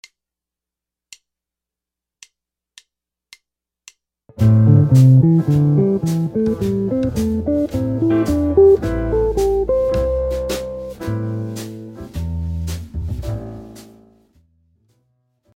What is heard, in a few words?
pentatonic scale pattern, guitar